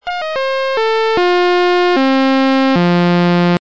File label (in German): Naturtoene F, Blech